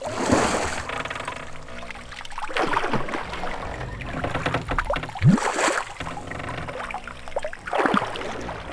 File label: mill water; ambient mill; World of Tanks Blitz